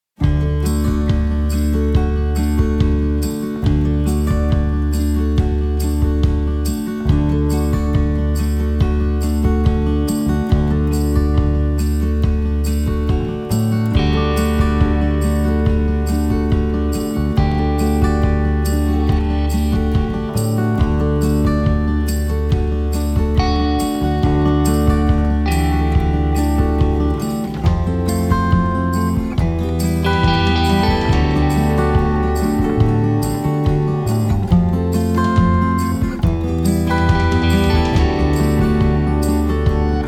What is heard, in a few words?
Guitar Ramble